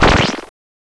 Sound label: New Splatt; Icebreaker